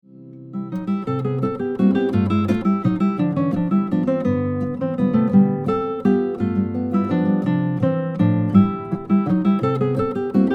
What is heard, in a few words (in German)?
Rainsberger Landler